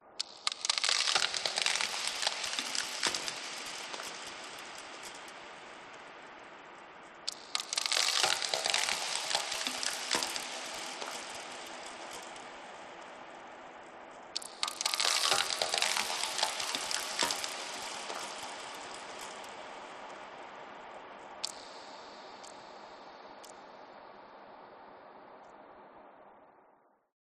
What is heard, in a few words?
Designed Ice Sequences; Gregor Quendel